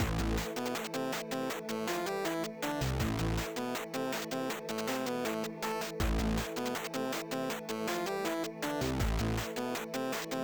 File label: music